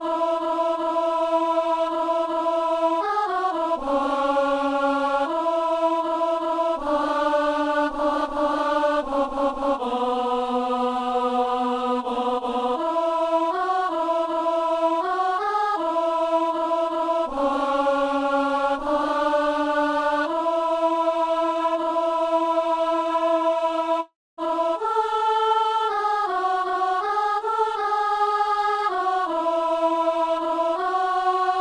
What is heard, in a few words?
alto; Messe du 17 janvier à Saint-Denis